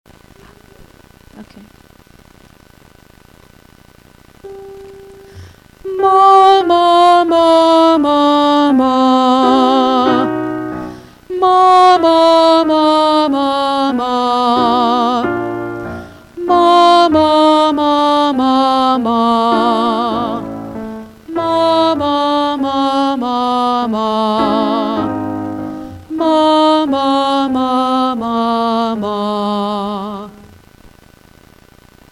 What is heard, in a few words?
Warmup, Vocalization